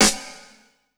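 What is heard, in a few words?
snare drum, Drum & Bass, 160 BPM; Ueberschall; Drum & Bass Resonance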